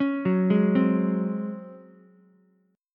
C sharp Major 7th Suspended 4th C sharp Ukulele; C#M7sus4 arpeggiated; JGuitar

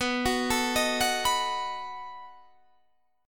B 7th B down Guitar Half Step Down

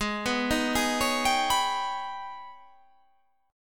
G sharp Minor Major 11th

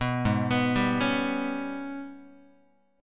Ab Minor Major 7th Double Flat 5th Ab, Guitar; AbmM7bb5; arpeggiated

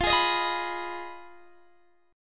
F Minor 7th Flat 5th, Guitar, DADGAD

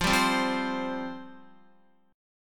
F Suspended 2nd F Guitar; Fsus2 strummed; JGuitar

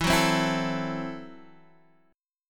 Gb Minor 7th Flat 5th, Guitar

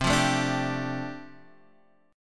D Minor 11th C

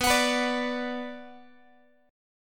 B Minor B Guitar; strummed; JGuitar

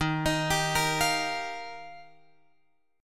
D sharp Major Add 9th

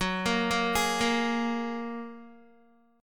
Gb Major Add 9th, down, Guitar; Gbadd9 arpeggiated; 4F,4A,5C,5G,5C,6E

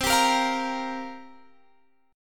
C 7th Suspended 4th, Guitar, Half Step Down

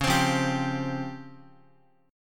Db Major Sharp 11th Db down Guitar; 4F,4A,5C,5G,5C,6E; strummed; chord shape; JGuitar

